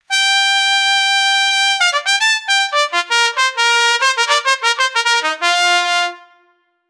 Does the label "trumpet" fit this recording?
Yes